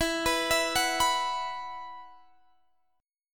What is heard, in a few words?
B Suspended 4th E